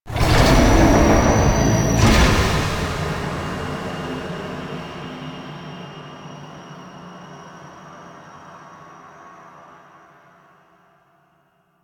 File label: connector stop; ambience; Warframe; Entrati